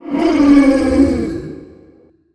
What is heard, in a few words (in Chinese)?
咆哮; 以撒